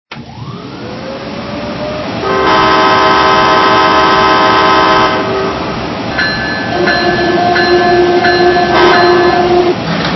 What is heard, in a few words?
AEM-7, trains